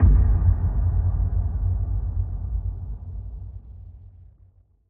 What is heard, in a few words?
Subhit Loop; horror and atmosphere fx; Silent Hill Downpour